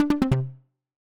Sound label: lose